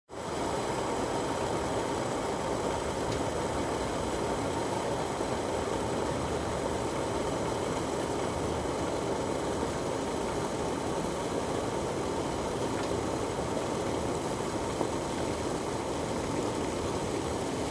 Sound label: Water is boiling